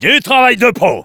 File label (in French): cheers, fr